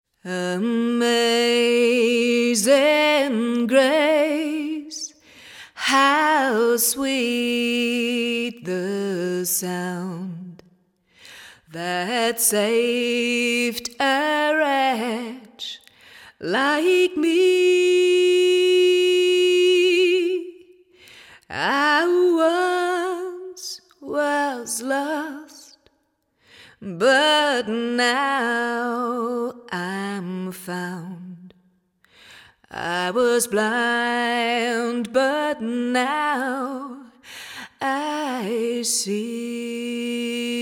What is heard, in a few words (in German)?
Gesang a cappella